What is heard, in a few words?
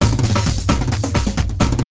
chorus fill toms